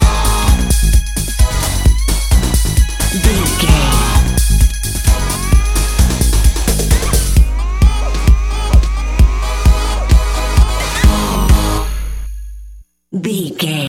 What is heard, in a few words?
wind me bumper, Dance, EDM, Club, Energetic; Exercise, Aerobic, Aerobics, Spinning, Workout, Disco, Eurodance, Confident